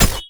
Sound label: knife throw